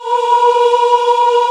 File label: C 5 CHOIR MX